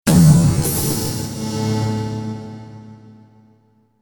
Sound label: dark bass